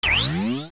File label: Arrow Select; Selecting magic arrows (part 2); zelda; OOT